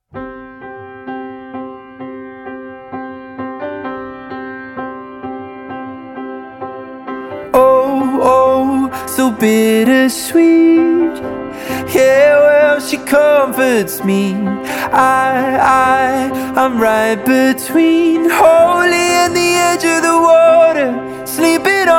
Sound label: Piano Version